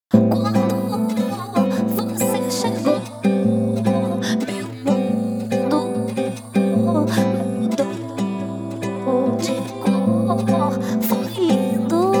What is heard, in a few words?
backing